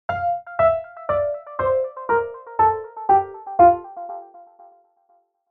Synth and piano F major descending scale

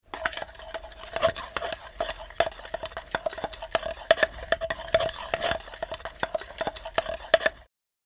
Hand whisking plastic bowl with wooden spoon

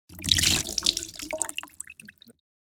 Wet sponge squeeze dripping water; cleaning

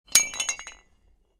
Grenade drop hard surface; gfxsounds

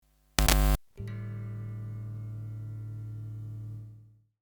Electric guitar plugging jack

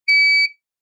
Simple beep tone

